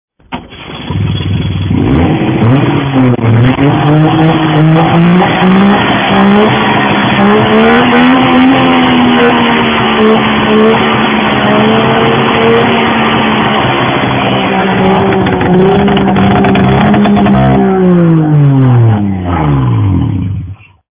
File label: saab 96 2 takt